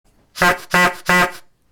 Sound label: duckish Squeeze Horn